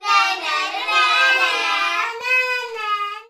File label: human group children x6 uk aged 3 to 8 shout nah-nah-nah-nah-nah